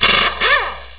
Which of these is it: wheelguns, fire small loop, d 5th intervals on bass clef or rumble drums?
wheelguns